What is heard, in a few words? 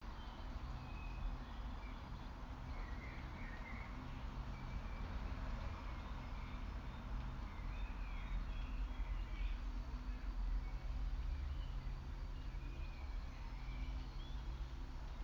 Wind Chime and Birdsong on a Rainy Day; Bird Sound Collectors